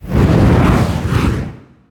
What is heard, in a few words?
projectile; smite; phoenix